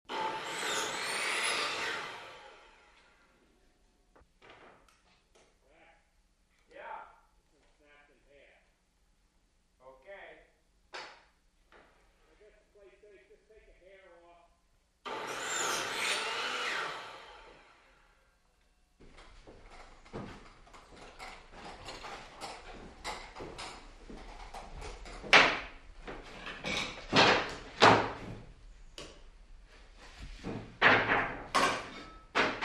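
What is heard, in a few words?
Circular Saw Hammering, construction large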